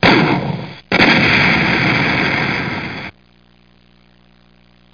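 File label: grenade; reptal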